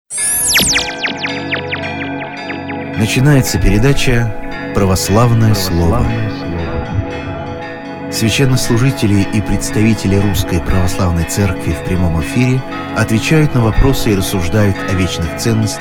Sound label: JINGLE WORD